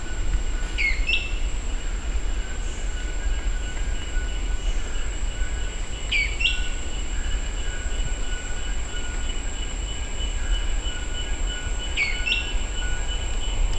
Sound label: Tyranneutes stolzmani; R Ec Gunma; P Silveira